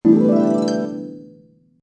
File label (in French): SUCCES; MOBICLIC 69